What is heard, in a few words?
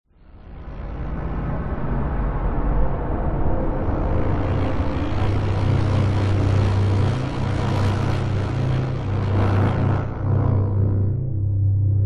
sound design drone texture glitchy distorted interference style; scott lawlor